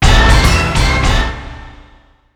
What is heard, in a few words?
multihit